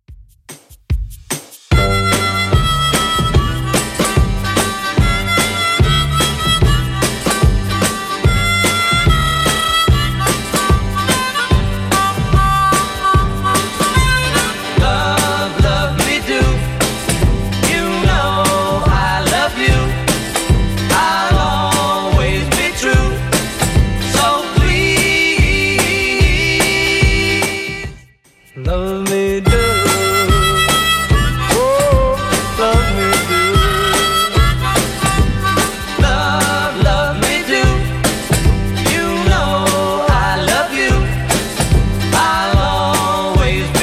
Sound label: 60s 147bpm; Redrum